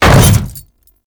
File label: Hammer Boss Land Default, Footsteps; Warframe; Grineer Hammer Boss